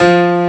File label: AMB PIANO